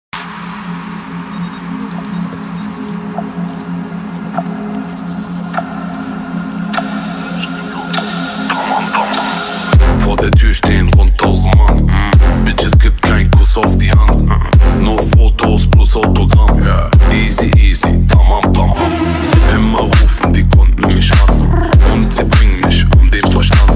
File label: bass music